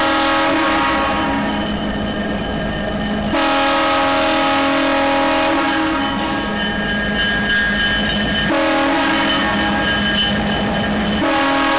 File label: horn